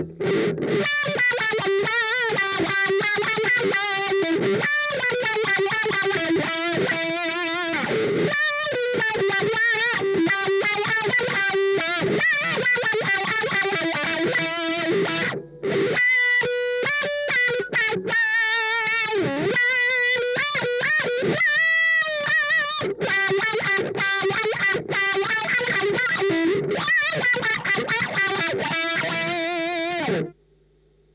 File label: wah